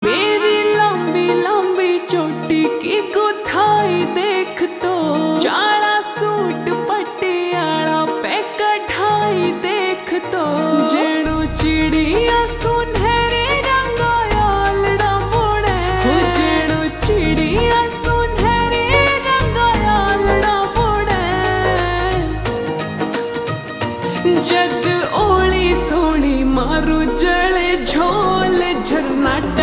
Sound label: Ringtone Slowed Reverb